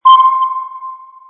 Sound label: new visitor alert